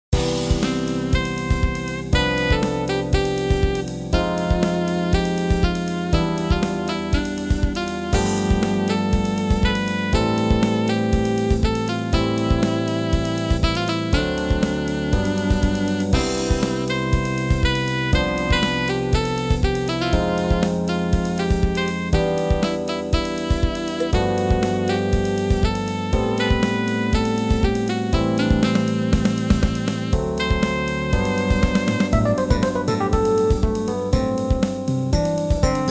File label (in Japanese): 穏やか